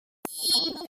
Button Sci Fi Beep T, buttons & beeps

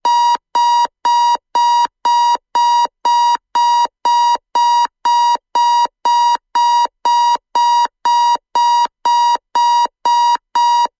alarmclock alarm

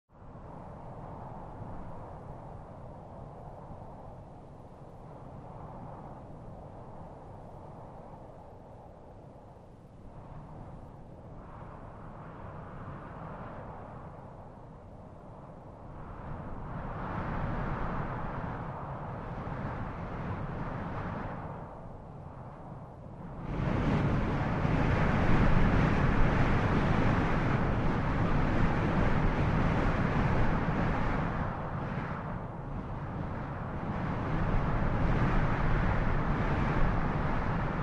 Strong Gusting Moun, gusts

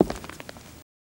FS Male On Hardwood, singles wood

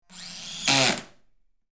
High Pitched Drill, air tool

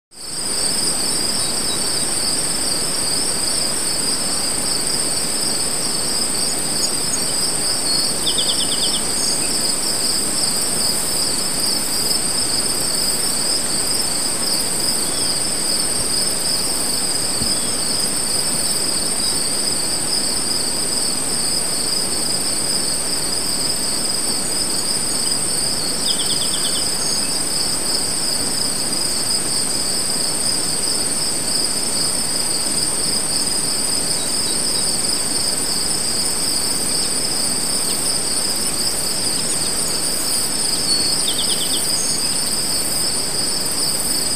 Crickets Light Open A

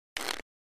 Cards Shuffle Deck; casino games

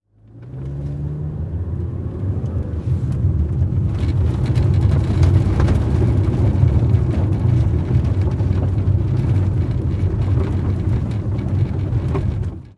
Sedan Pavmt Grvl Int

